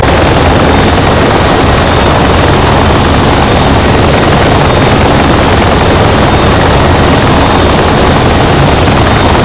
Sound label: Apache Gun Ship